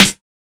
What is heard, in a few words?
00s wet snare drum g key, snare single hit tuned to the G note